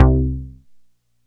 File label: JUNO SEQ, Juno Synths; E-MU Formula 4000 Series Vol. 3; Analog Odyssey